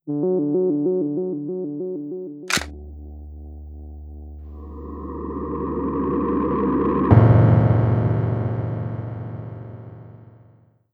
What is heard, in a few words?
boom